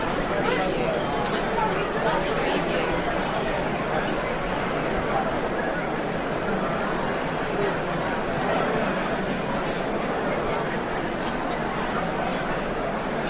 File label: Restaurant Crowd, Evening Party, Sophisticated